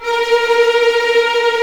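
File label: A#4 tremolo, String; East West; The Ultimate String Collection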